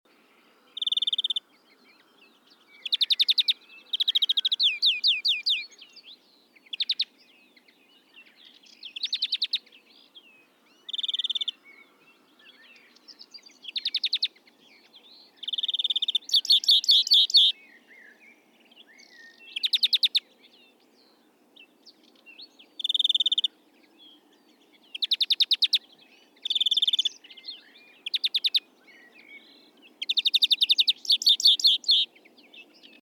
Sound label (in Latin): Chloris chloris; SETE